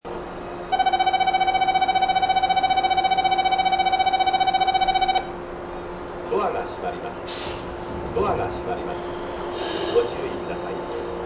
bell, osakaloop; imamiya; jrwest

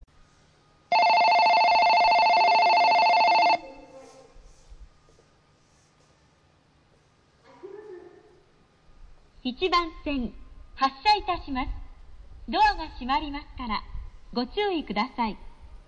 shimbashi bell; jreast; yokosuka

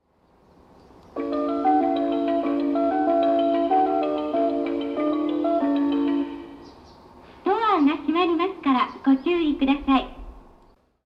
melody, station

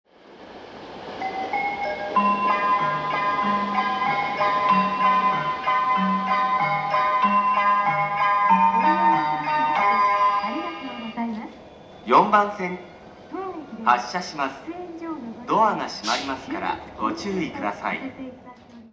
nippori melody; jreast

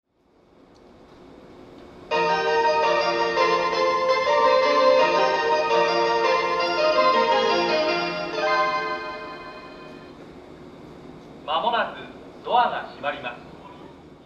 melody

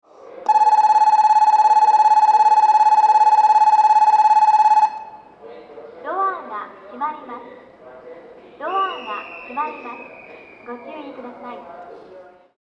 bell, osakaloop; tennoji; jrwest

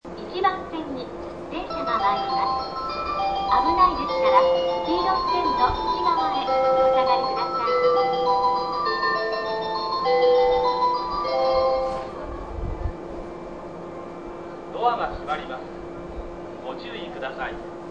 kawasaki bell, station; jreast; tokaido

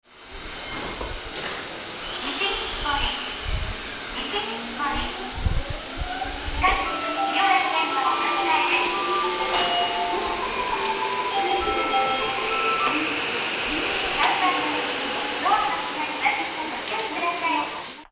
nishinippori melody, station, yamanote; 3番線（山手内）発車メロディ; jreast

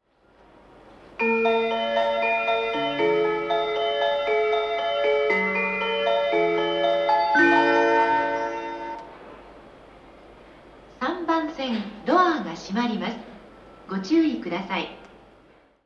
saitamashintoshin melody; jreast; utsunomiya

